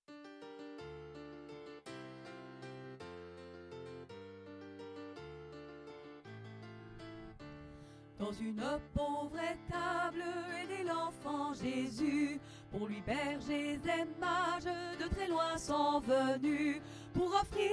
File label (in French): Noel joie dans le ciel basses